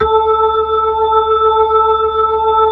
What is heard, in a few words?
B-3 Chorus; Roland LCDP10 Keys of the 60s and 70s 2; Br. Ch. Xfade; Br. Chorus Wh